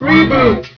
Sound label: death; hunger; franklin